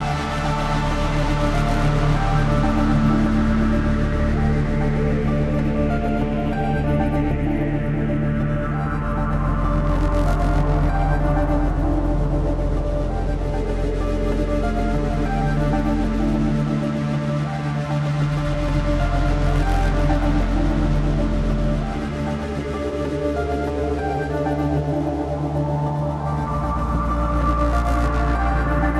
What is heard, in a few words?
Moon Patrol FX Stem Loop